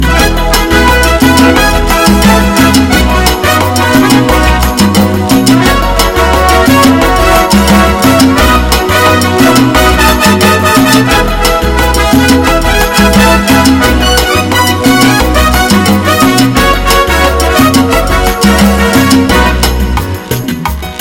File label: Hot but Cool Timba